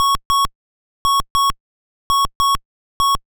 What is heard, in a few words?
timer done